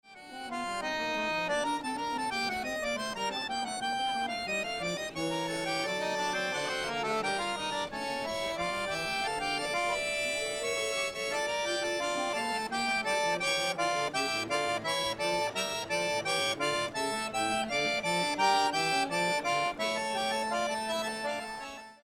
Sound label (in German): Musik; Pocketrak CX